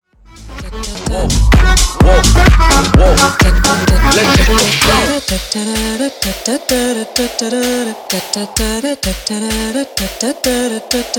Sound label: Bootleg House 128bpm